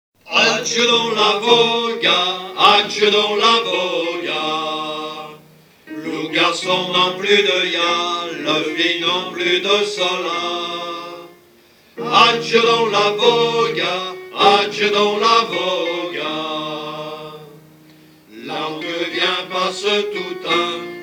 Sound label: Vocal